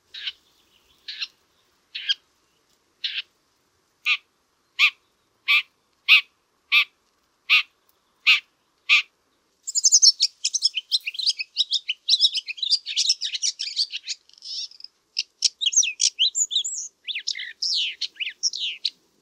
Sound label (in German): neuntoter